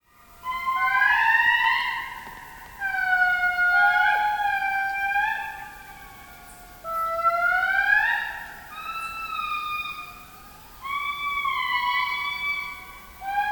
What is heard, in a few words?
Andasibe Lemurs, madagascar